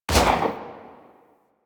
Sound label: Fire Shot, Weapons; Lato Redux